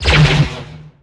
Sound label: Pickup Scifi Health